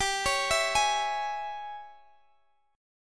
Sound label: G sharp Major 7th Suspended 4th Sharp 5th, G